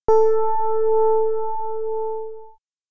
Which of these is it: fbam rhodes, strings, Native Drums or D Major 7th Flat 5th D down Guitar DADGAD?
fbam rhodes